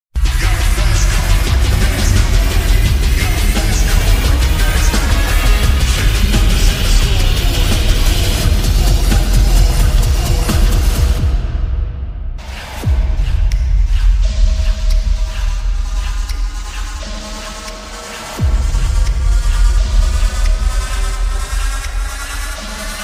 BGM; Fast Furious 10

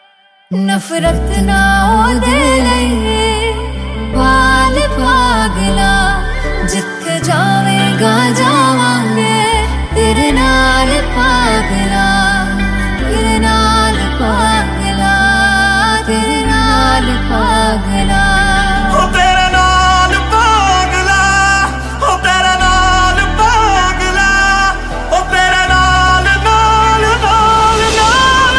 Female Version

